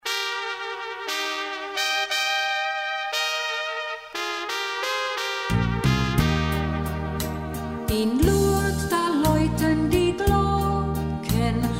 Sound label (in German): In Lourdes da laeuten die Glocken